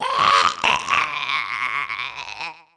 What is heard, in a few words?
hag die; DAoC; Toa; Gamestar